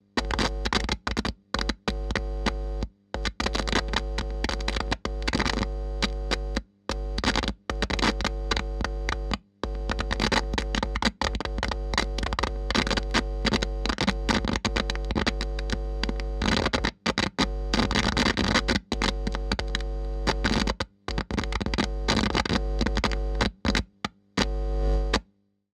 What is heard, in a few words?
Electric Guitar Amp Cable Connection, NOISE; MatiasMacSD